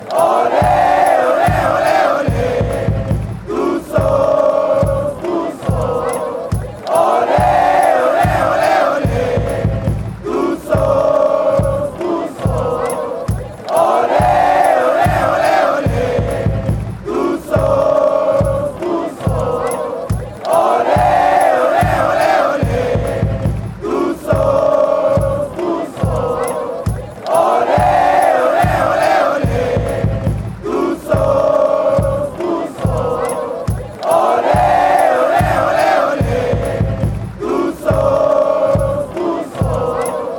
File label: ole ole ole ole, fanchants; tuzos tuzos; international